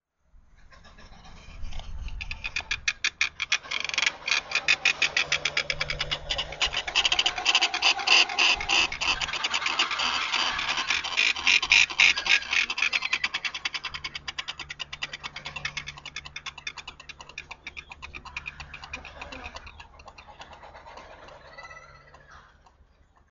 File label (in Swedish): Ispetrell